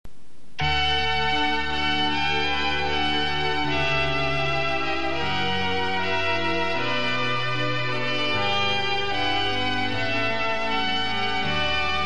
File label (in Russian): Музыка; Вестники истины (биографии)